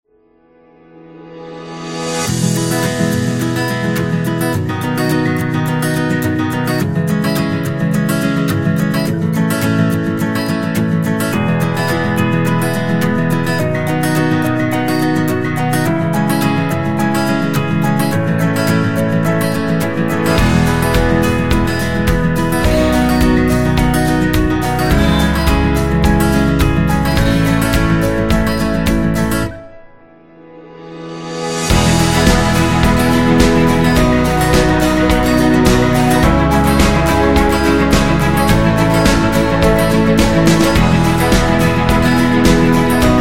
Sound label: Acoustic Inspired, happy music